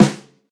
strong bottom end snare sound g sharp key, snare single hit tuned to the G# note; snare drum samples